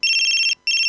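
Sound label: keitai, mobile phone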